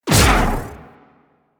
Pulse Laser Shot; Railjack

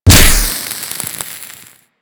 Generic Ability Impact; Warframe; Ember

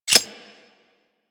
Heavy Shotgun Reload; Weapons; Warframe; Tenno

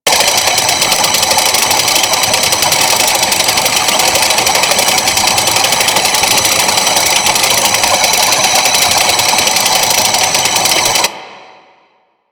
flying robot engine loop; Warframe; Veilbreaker; Quests; Ambience; Kahl

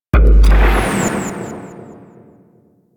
Antimatter Worm Hole Spawn; Warframe; Warframes

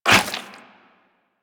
Run Goo, Footsteps; Warframe; Tenno

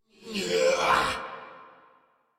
Attack Effort; Warframe; Dax Knight; en

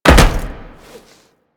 Land Heavy Metal, Footsteps; Warframe; Tenno

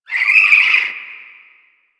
Owl Idle Chirp; Glyph Puzzle; Warframe; Duviri

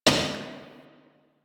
Turnstile Stop; Warframe; Ambience; Entrati

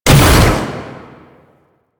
Taller Door Open Mech; Warframe; Ambience; Entrati

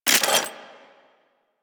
Grenade Launcher Mech; Warframe; Weapons; ThanoTech

